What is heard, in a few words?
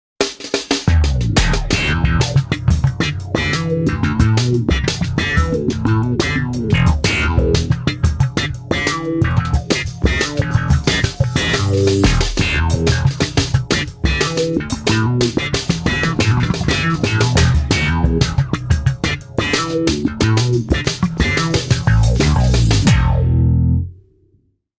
tone bass; aw 3; tone demo